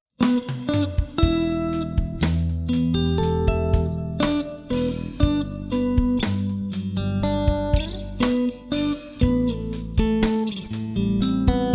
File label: bass